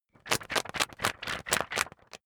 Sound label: magazine wobble hard